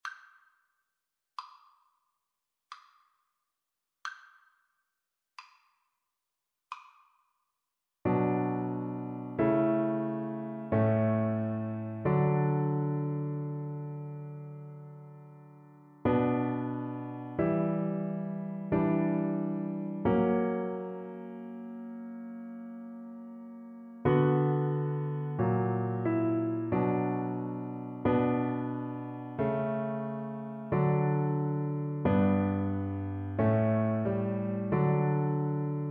bach come sweet death viola

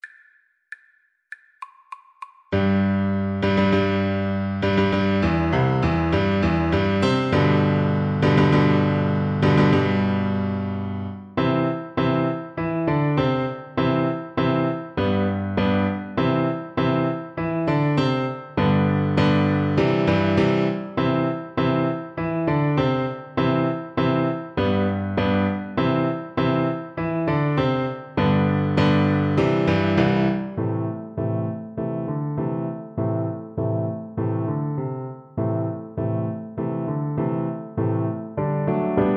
Oboe

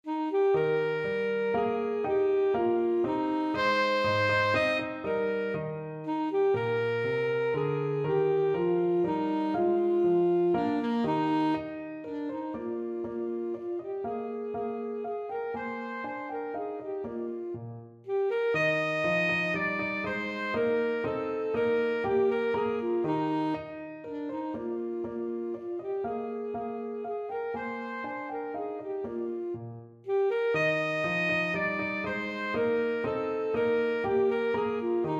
German Dance, saxophone

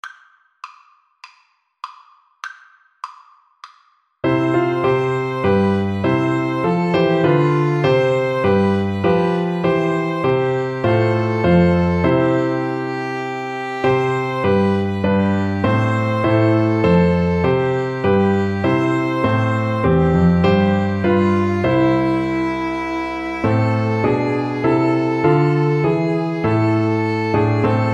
midnight clear, Violin Flute Duet

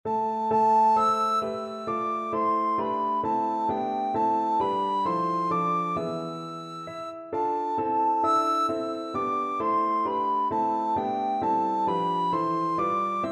god rest recorder

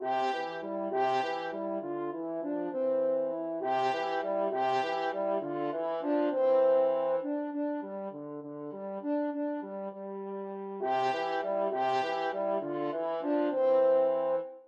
french horn duet

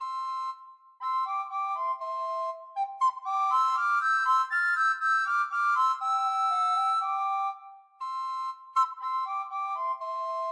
recorder duet; mozart k487 no5

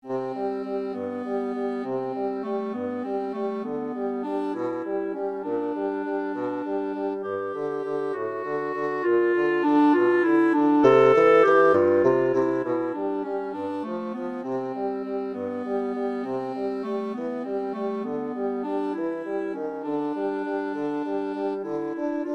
clarinet bassoon duet

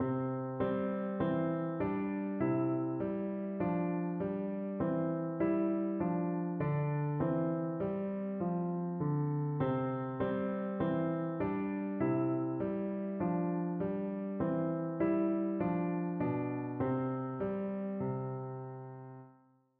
piano

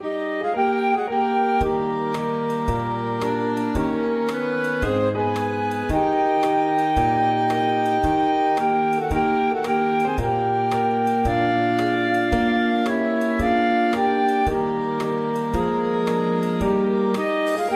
mixed ensemble

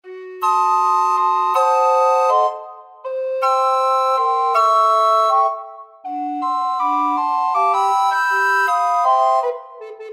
recorder quartet

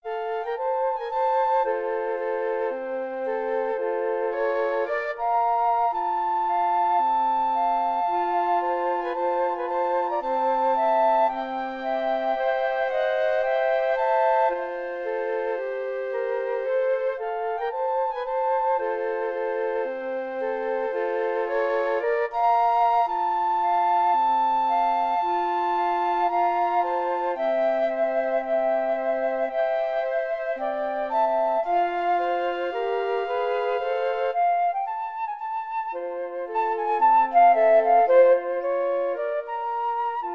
fl trio

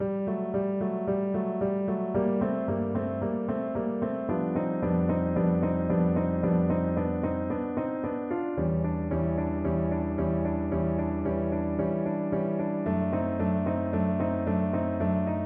for behold, Trombone

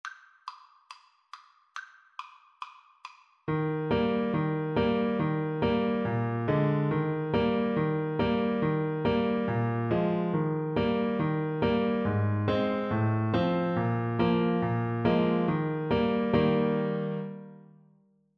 yankee doodle, Tuba

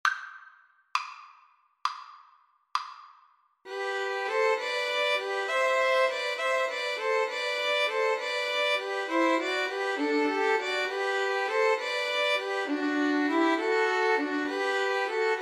pastorale, violin trio

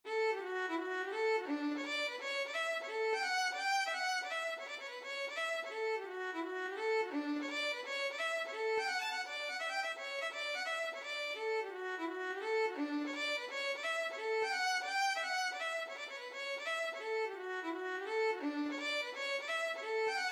hornpipe, violin

